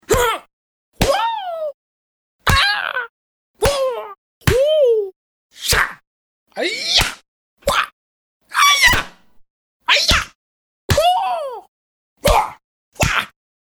Martial Arts, Martial Arts hits with vocal sounds.; Punches and Hits; Combat